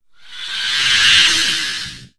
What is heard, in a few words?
SWAAIEEE, VOICE; Rarefaction - Poke in The Ear With A Sharp Stick